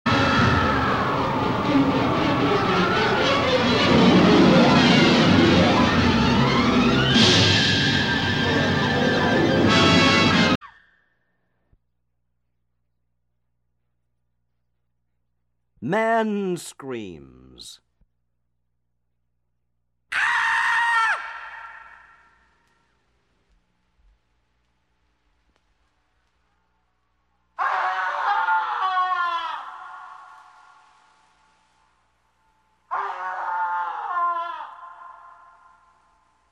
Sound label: Terrorized Screams; 1001 Sound Effects; Halloween Scary Music and Sound Effects